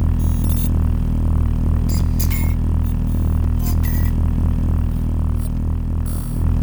turret loop; LoL; heimerdinger

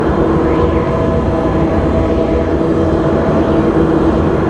building hq on loop; LoL

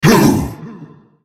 effort; nasusskin05; Character Voices - English

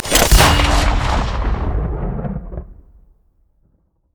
quickdraw; LoL; yasuo skin01

